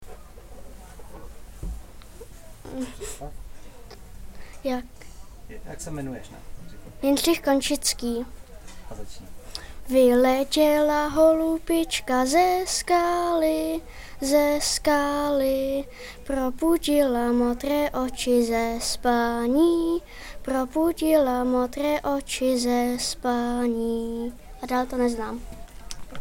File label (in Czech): slavíci; ornitologický víkend